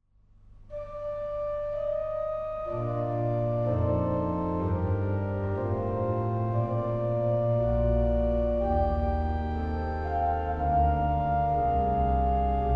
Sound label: Praeludium, orgel; Aulfingen; kulturerbe orgel